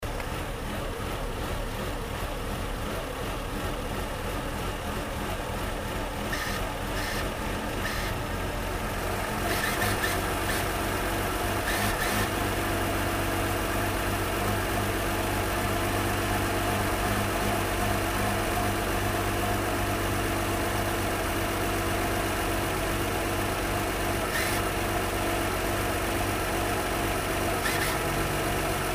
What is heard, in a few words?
maxima noise